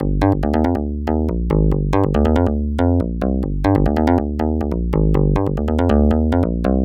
Synth Loops G 140 Synth Slap; Trap and Dubstep

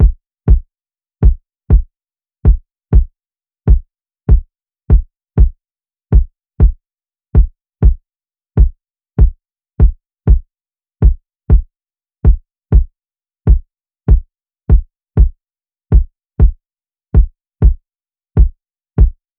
Drum Loop - 98 BPM Kick, Afrobeats; Loop Stems; Cymatics; PARADOX II - Drum Loop Collection; Artist Drum Loop

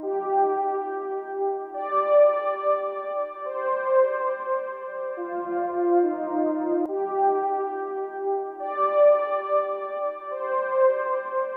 140 BPM C Min Pad; Cymatics; Moore's Law; 2022 Melody Collection Platinum Expansion

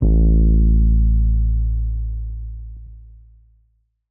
Bass Guitar A; Basses; Cymatics; Eternity Sample Pack

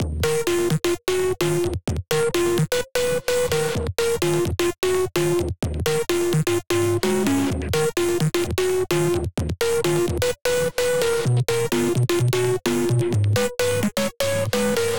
128 BPM D# Min Lead; House; Drop Loops; Loop Stems; Cymatics

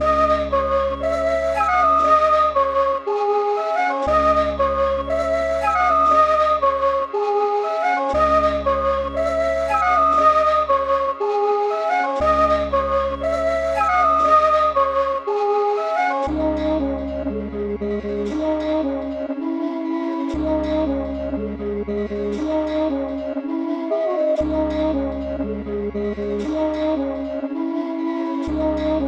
118 BPM C# Min, Melody, Trap; Cymatics; 2022 Melody Collection; Trap Pt 2

